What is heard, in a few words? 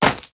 Dirt Run